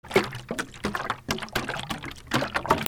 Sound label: HOUSE